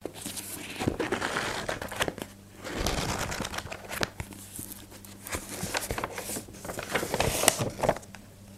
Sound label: Flipping Book Pages